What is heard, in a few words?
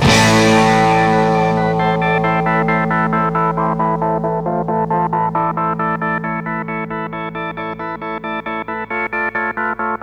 organ mono